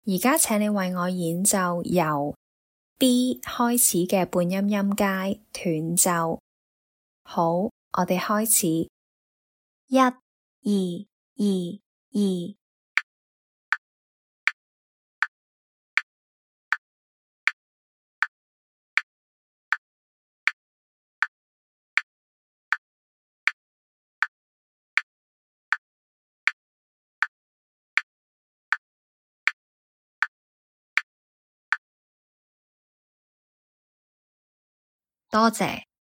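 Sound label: chromatic scale starting B staccato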